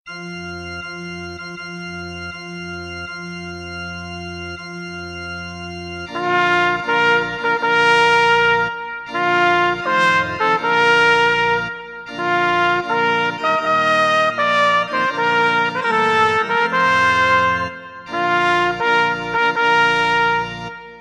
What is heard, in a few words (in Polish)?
marsz wagnera 1 trabka